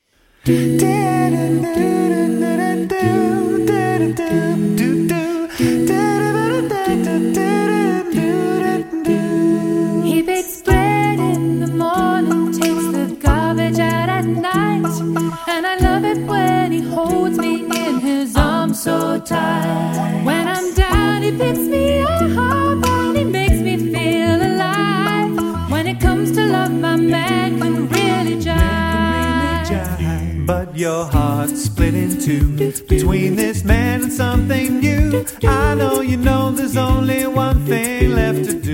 Singin a Cappella

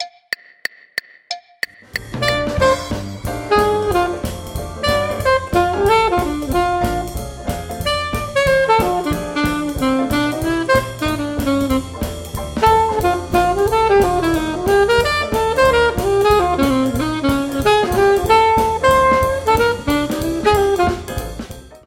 Alto med

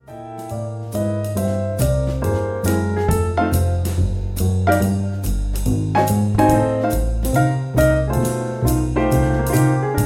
Jazz Improv

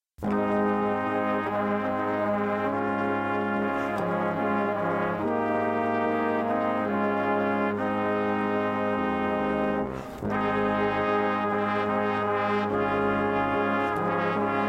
silent night brass

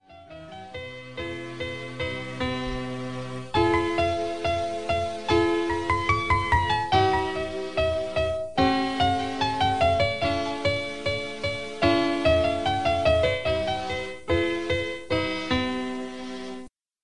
18th C Drawing Room

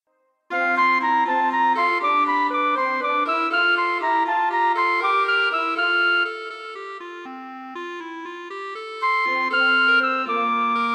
Jig

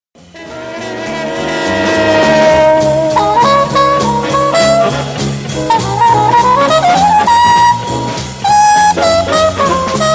Solo fast

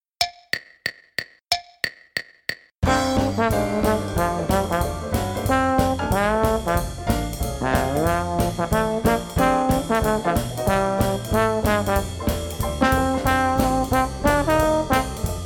Trombone

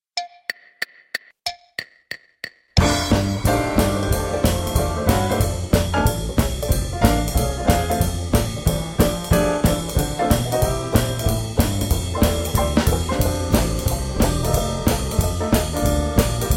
Saints backing

